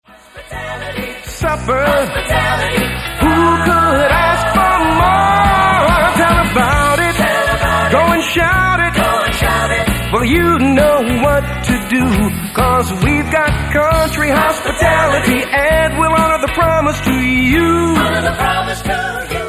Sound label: 1st and 2nd Soprano plus Alto choral background